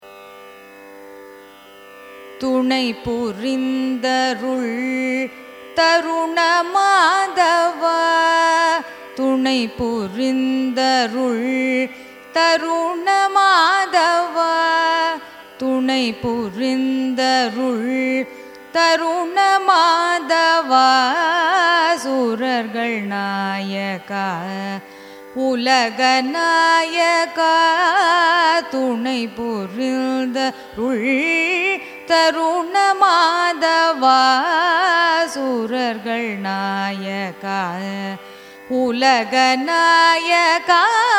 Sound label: raga varamu carnatic; carnatic music